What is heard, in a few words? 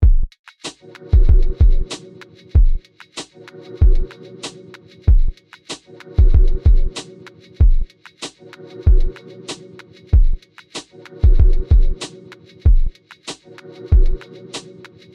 ambient beat